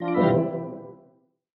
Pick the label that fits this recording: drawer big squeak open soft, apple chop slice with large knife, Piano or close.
close